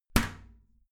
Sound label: toilet lid close regular; haus-garten; badezimmer